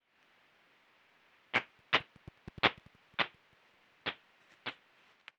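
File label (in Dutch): Pipistrellus pipistrellus 20 kHz Sociaal, gewone dwergvleermuis; PipPip; Dit is de tijd van het jaar dat we vaak op onregelmatige tijden op pad zijn voor onderzoek; paarverblijven van gewone dwergvleermuis; silvavir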